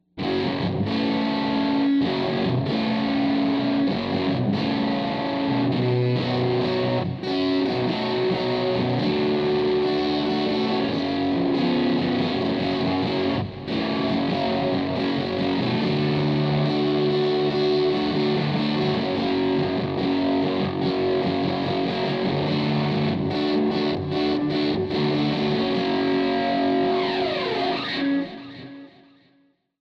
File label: electric